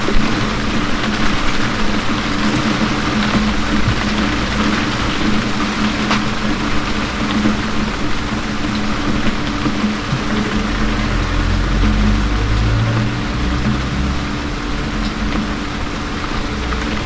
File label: 30 seconds of heavy rain